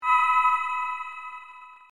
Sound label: blue magic; ff3us